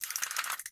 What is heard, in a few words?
Cartridge Dismantling Bolt; MiSide